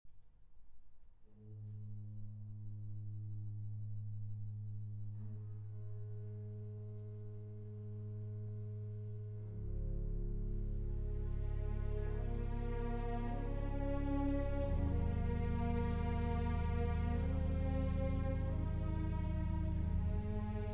Symphony in C Minor, Organ; Zubin Mehta; Daniel Chorzempa; Saint-Saëns; Symphony No. 3; Op. 78

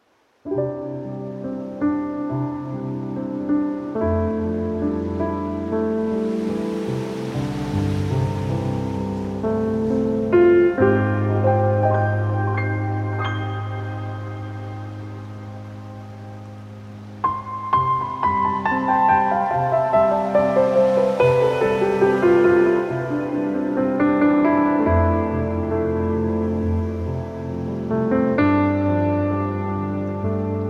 Magic Piano

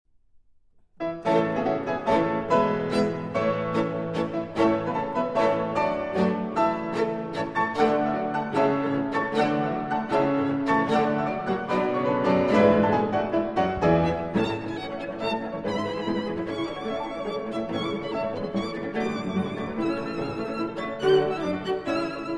Piano Trio in A Minor; Op. 50, TH. 117; Tchaikovsky

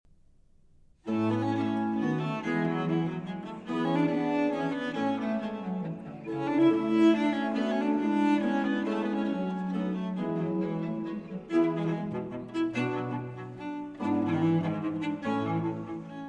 Sonata For Cello and Continuo in B Flat; Vivaldi

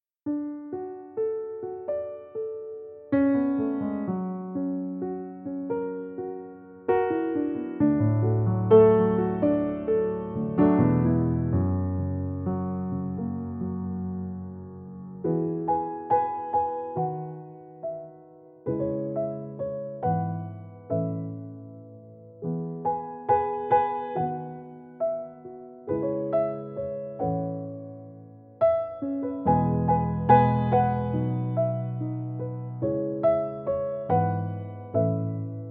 Piano Version

